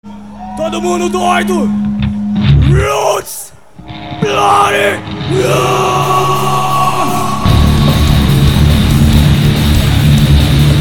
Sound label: DISTORTION, Live Cover